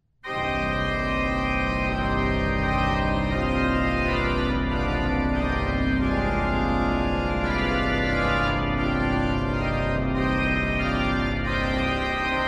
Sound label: Praeludium c moll, orgelbau; Bach; BWV 546